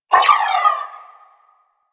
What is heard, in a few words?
Briefcase Akimbo Pistol Alt Proj Whizzby; Warframe; Weapons; Corpus